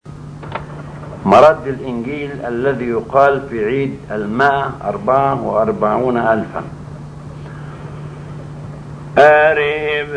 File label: Gospel Response; Alhan Shohada; 144000